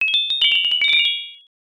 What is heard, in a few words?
kagura suzu